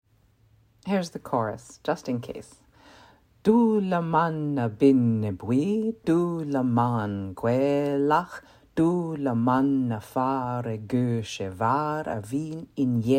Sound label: Chant Chorus